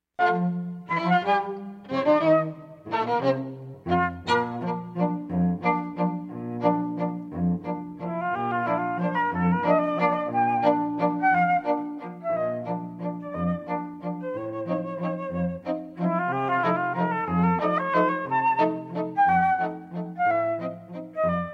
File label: Fl Trio; Voices of Spring; Cantilena South Florida